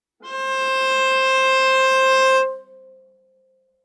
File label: Viola arco ff sul D C5 stereo; MIS Pitches - 2014; Strings